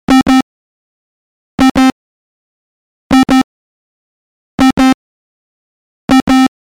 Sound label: Mini Brute SAW